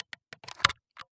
Video Player Opening; AdobeCC

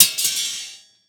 Impact Metal Bars Hit Cement Single

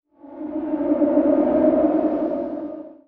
Title Transition Stab Dark Short; Production Element; AdobeCC

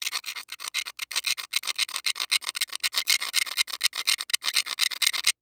Foley Glass Sharp Glass Scrape Multiple

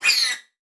Multimedia Internet CD-Rom Flash Wipe Cartoon

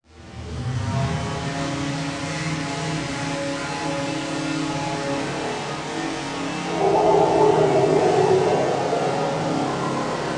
Pitch Rise Fall; Module Production Element Title Imaging; Imaging Elements

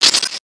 Digital; Adobe; Multimedia Internet CD-Rom Flash

